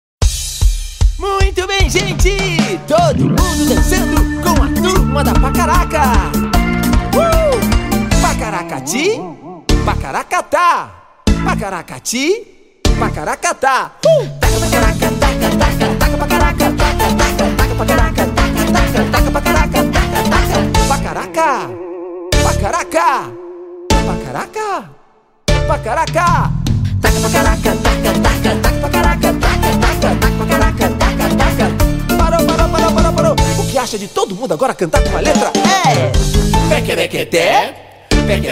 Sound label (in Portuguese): musica infantil